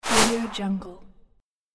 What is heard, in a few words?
breath swoosh